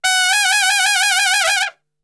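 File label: F# trumpet shake; Best Service; Brass Super Section; TRP SHAKE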